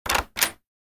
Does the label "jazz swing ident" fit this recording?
No